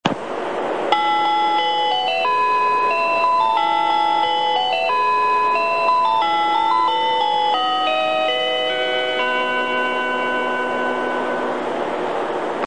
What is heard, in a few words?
train chime; きらきらうえつ; 「きらきらうえつ」で使用される４８５系のチャイムです。